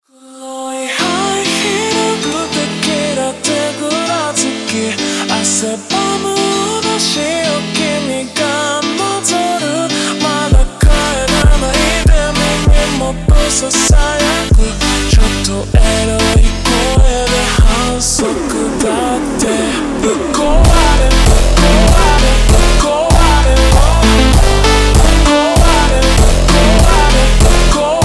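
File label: ultra Slowed, phonk music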